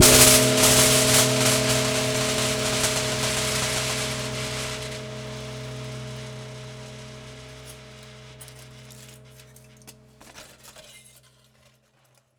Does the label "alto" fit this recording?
No